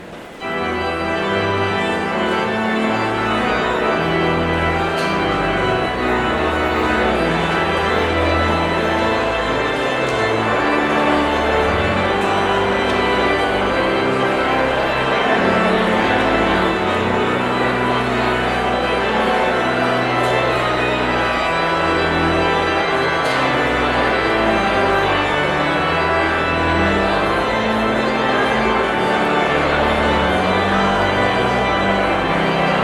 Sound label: Postlude